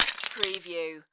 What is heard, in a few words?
Impact Tile Smash